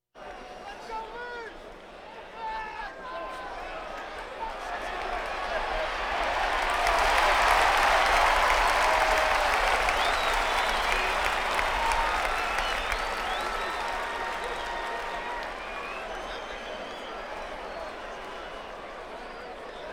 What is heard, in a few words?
Crowds Race cars Baseball